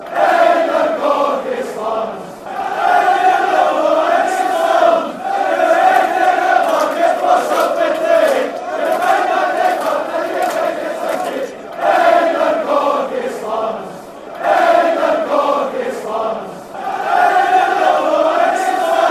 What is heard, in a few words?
fanchants; your gorgie slums